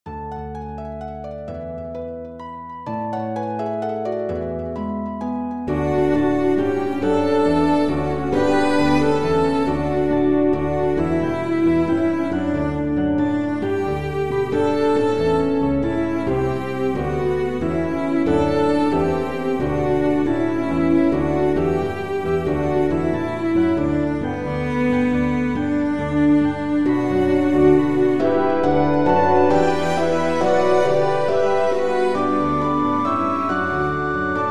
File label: bells vocal orch; Ring Out Wild Bells